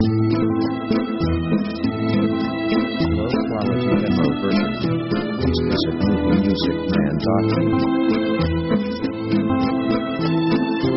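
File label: orchestral spanish bolero like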